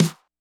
snare, Acoustic

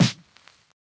snare, snare house

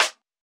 hiphop snare, Effect on

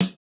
no effect j city pop 1980 snare

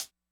hihat, Acoustic

